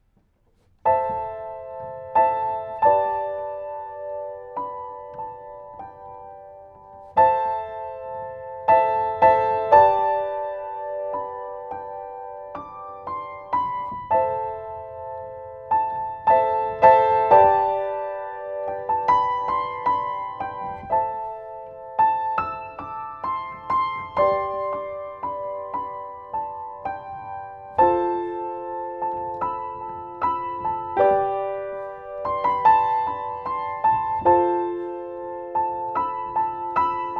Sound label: Piano, UM17; SHAFER GRAND PIANO; AMI UM17